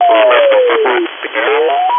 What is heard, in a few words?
carrier beat tone; ground demodulation ladder; ESA